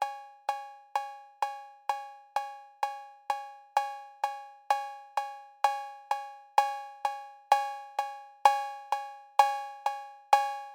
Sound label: CB808